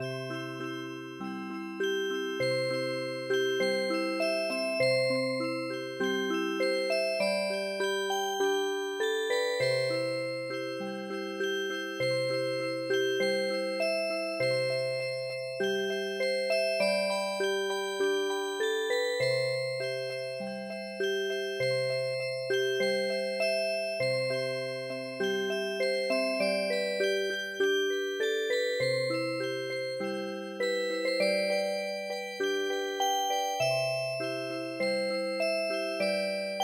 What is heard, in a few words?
lets go hunting Music Box